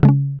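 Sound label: GUI C#, acoustic guitar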